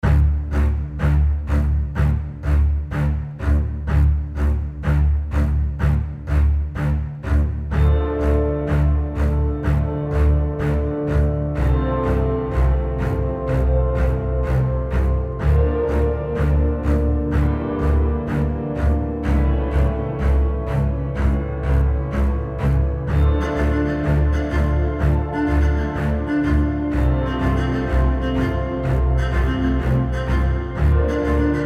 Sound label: Cello Power